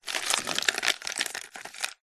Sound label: coffee pods in plastic container movements handling; food drink coffee nespresso